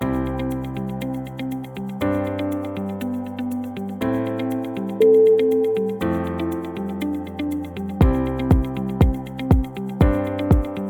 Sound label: uplifting road